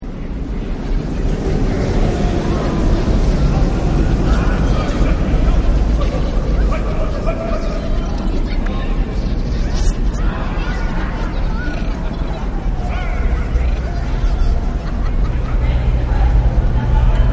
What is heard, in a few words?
ambient